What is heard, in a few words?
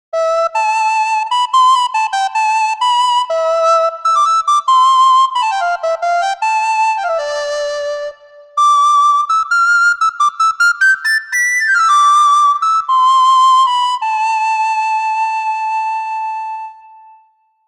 Recorder Soprano; Kontakt Wind Paks